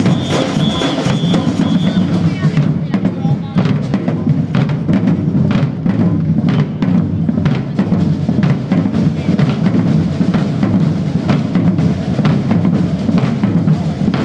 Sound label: drummers in Edinburgh